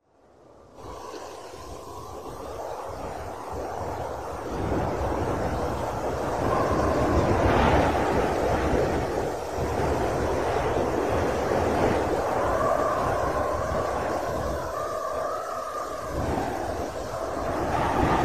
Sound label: snow